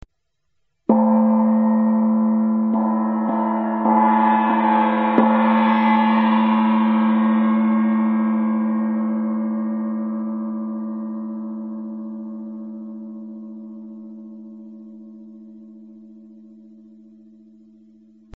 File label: tamtam